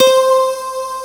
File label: nylon, JD8 synth